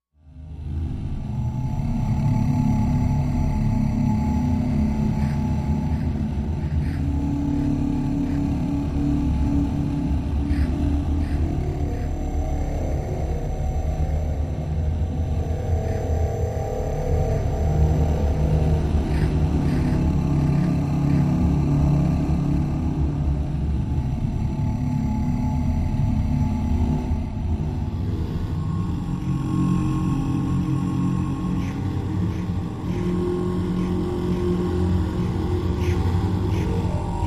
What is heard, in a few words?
Drone Fear Deep Drone; eerie ambience